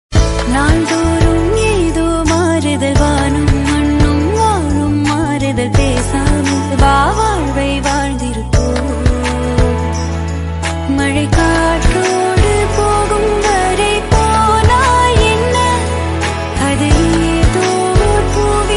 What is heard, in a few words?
female version